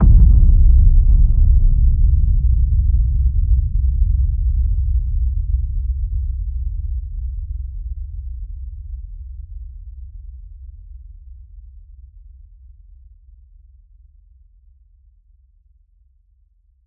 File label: HIT Boom, Cinematic Hits Designed